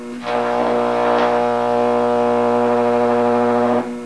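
Microwave Oven Transformer arc 2, the steady discharge of a Microwave Oven Transformer; What you hear here (!) is the steady discharge of a Microwave Oven Trans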